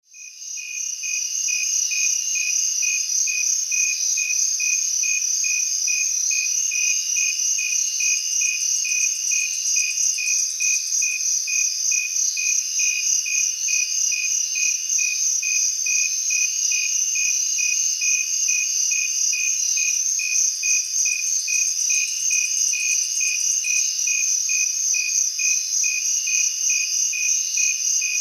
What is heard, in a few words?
City Crickets; smallsounds